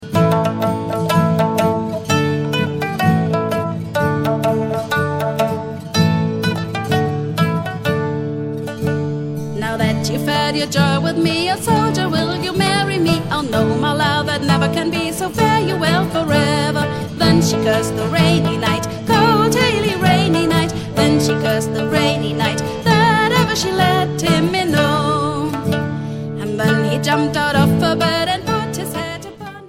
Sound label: Cold Haily Rainy Night